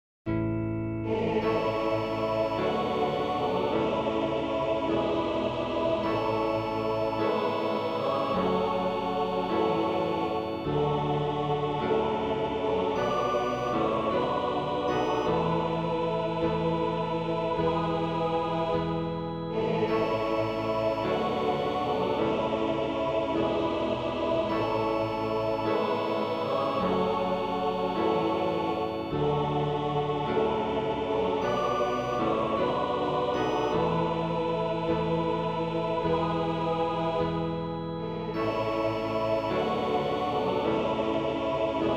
Partition a 4 voix en la majeur; Psaume 130 (Hebr. 131)

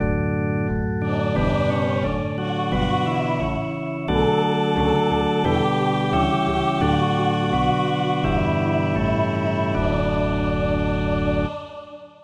Pour organ; Psaume 118 (Hebr. 119); Heureux ceux qui marchent suivant la loi du SEIGNEUR

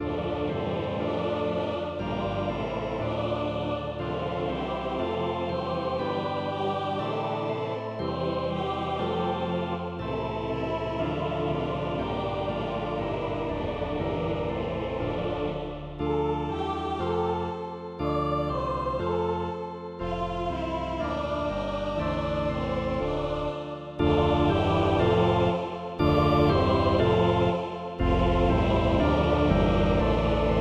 Kyrie avec invocations prealables, Audio a 4 voix; Messe de Verrieres; Partition a 4 voix

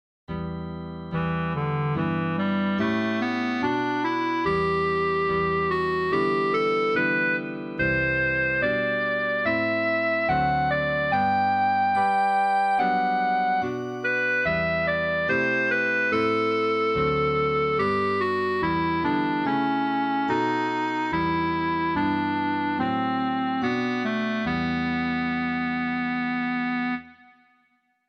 Psaume, 1 clarinette; Psaume 101 (Hebr. 102); Variation 3

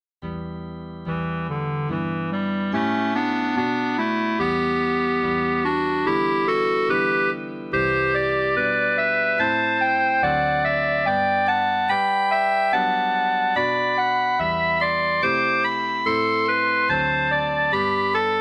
pour 2 clarinettes; Psaume 101 (Hebr. 102); Variation 4

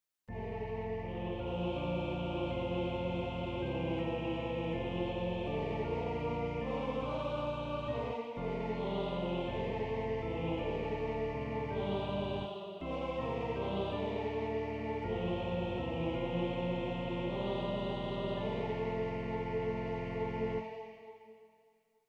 Psaume, vocale polyphonique; Psaume 115 (Hebr. 116 B)

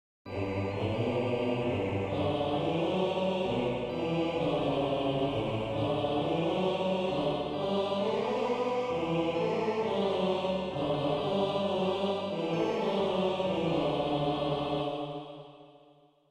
Alleluia des montees a 4 voix avec schema melodique invocation a 2 vo; Messe de Verrieres

